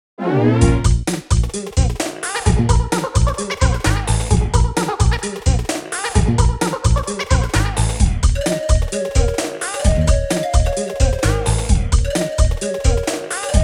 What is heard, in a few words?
Forest Interlude Clear; donkey kong country 2 fan remastered; Diddy